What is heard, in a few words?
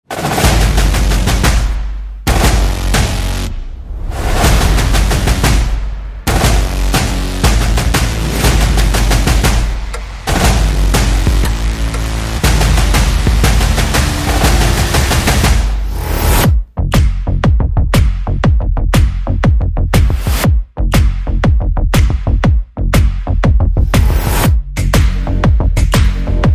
No Lead Synths